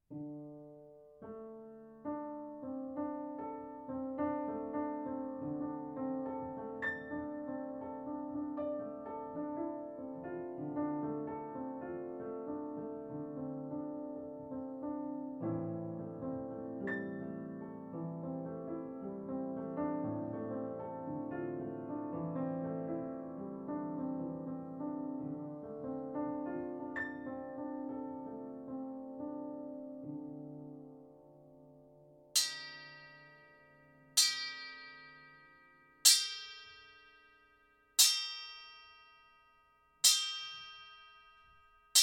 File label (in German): Playback lang